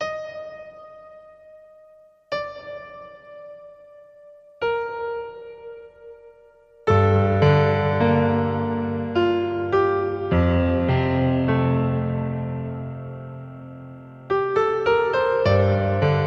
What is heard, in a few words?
Piano & Violin